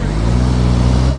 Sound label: truck; minetest; digtron